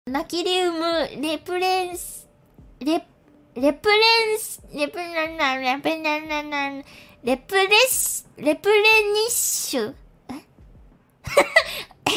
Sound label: applause; Amasetic4.0; gnahus